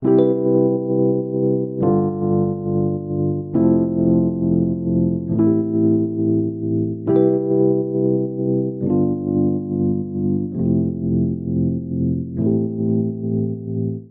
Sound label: Progression Pop Soulful Mood I - V - IV - vi C Major 68 bpm, Electric Keyboards; Ableton; Factory Packs